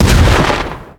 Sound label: rocket explode